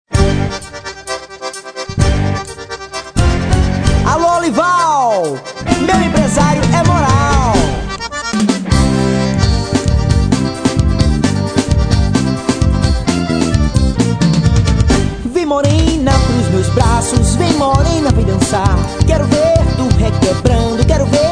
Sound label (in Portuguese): forro